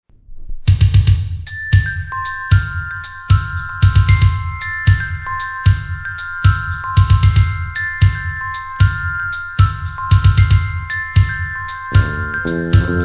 pizzicato

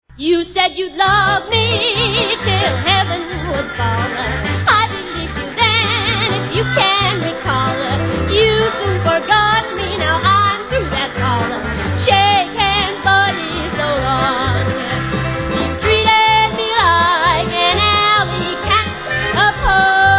jazz

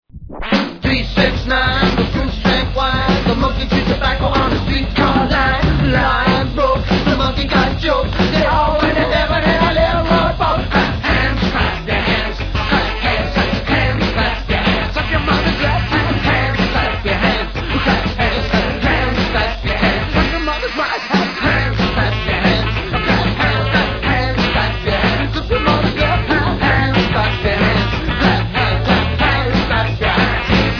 clappin; sandstep

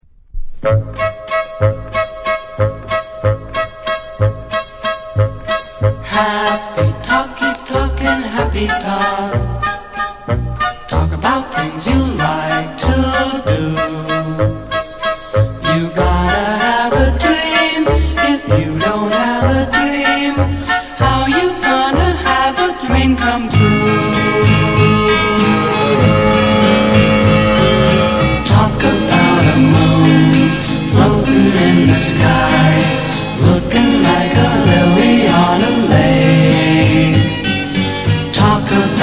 harp

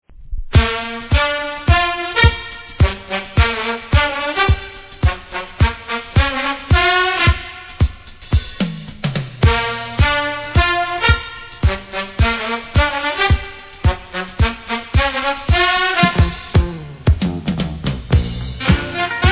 band sounds; disco

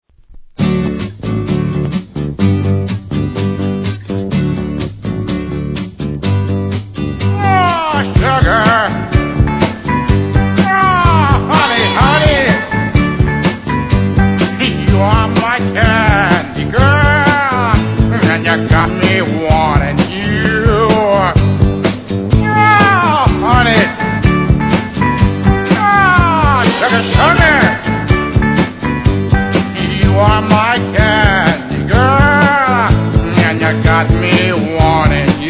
bell

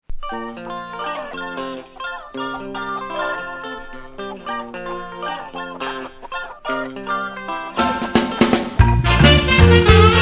beat

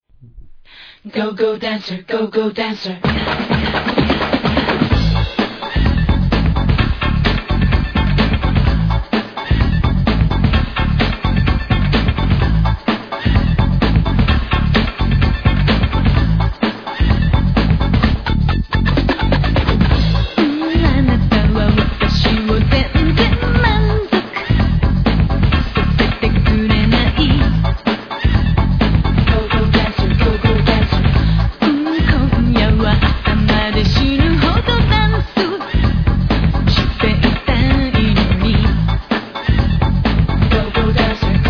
pizzicato

